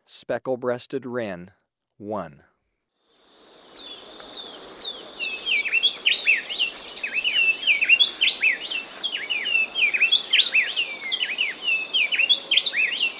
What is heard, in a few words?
Speckle-breasted Wren; S. America